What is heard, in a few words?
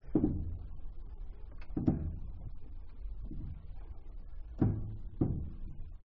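exterior steering gear; detunized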